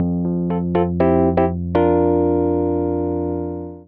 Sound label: STAGE EP; Wizoo - Powered DX